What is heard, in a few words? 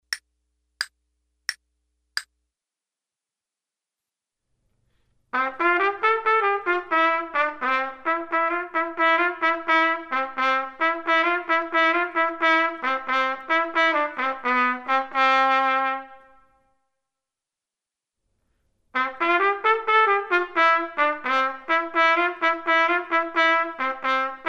trumpet; Selected Duets for Trumpet Podcast